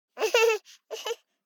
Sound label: BABIES GROUCH Boy 12 months Short; Baby Boom